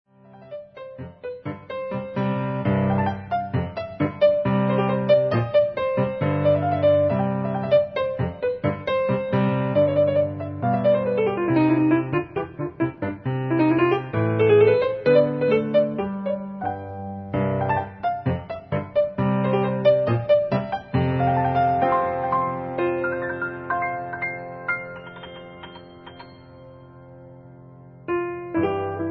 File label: hoedown; deck the hall